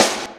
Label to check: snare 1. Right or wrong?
Right